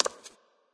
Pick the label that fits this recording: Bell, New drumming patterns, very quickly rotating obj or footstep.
footstep